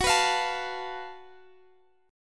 F sharp Minor Major 7th Double Flat 5th, F sharp, chord; F#mM7bb5 strummed; x,x,16,16,18,17; chord shape